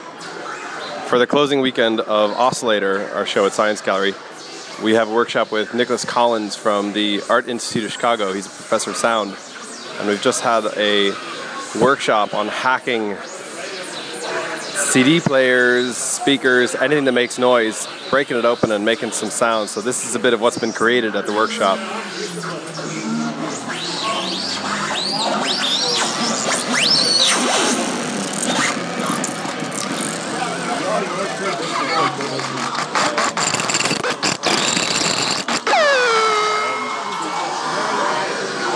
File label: sounds of oscillator; audiohacking workshop; with nicolas collins